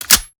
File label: bolt close; tacz; daffas arsenal